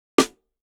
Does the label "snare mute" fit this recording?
Yes